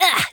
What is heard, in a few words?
grunt, Boys Attack; spiritheroes; Grunts